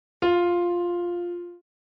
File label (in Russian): nota do